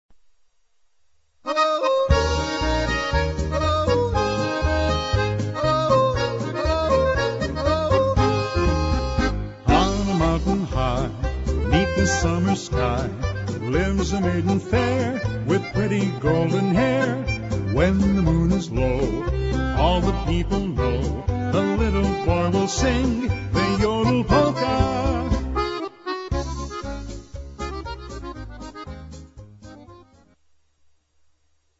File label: international yodel